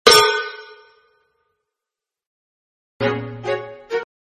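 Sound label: Cues, hit, instrumental; Jacks Mother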